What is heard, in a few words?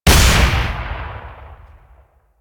Kuva Hek Shot, weapons; Warframe